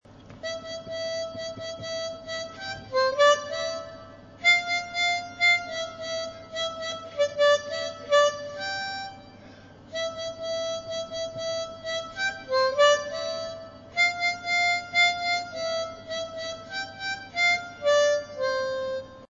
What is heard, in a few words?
jingle, harp